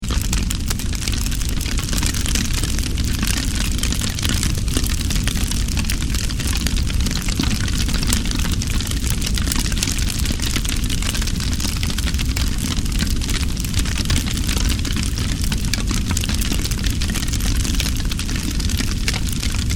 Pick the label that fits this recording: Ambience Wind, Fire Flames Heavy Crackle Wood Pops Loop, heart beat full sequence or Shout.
Fire Flames Heavy Crackle Wood Pops Loop